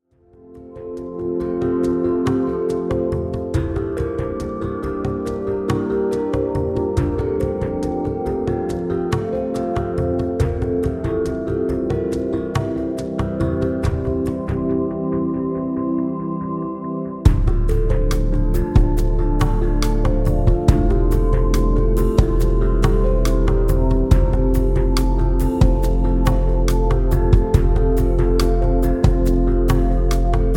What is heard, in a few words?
Relaxing